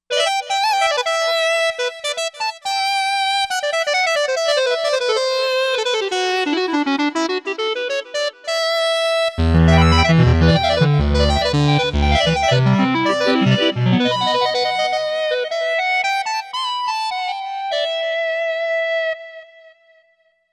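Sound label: Lead Squaw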